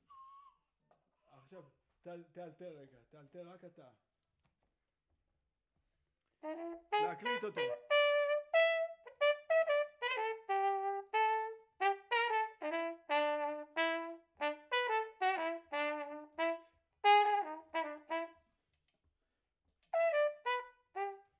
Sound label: Trumpet Room